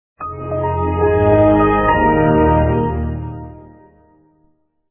WindowsXP; Включение ХР

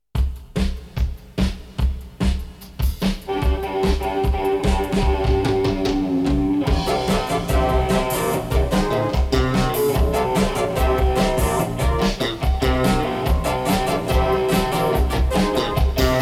instrumental

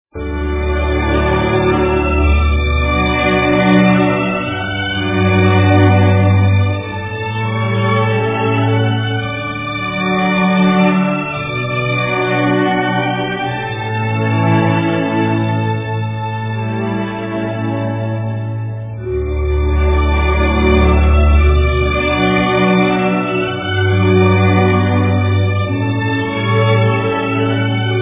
orchestral

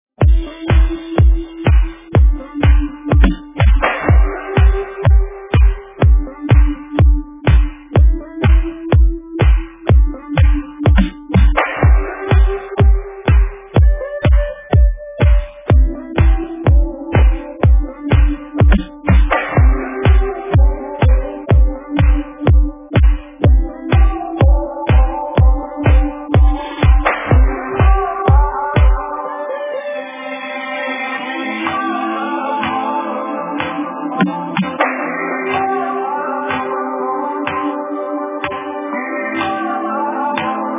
Noise; Label: Big Mama's House Records

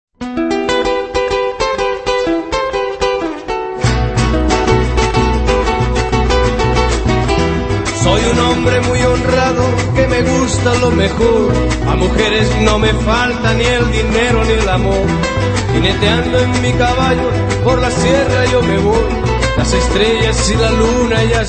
Spanish Guitar; Desperado

